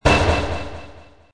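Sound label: level up; diablo 2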